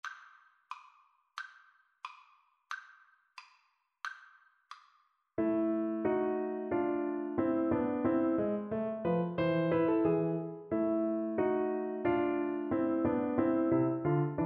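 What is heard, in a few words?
recorder karaoke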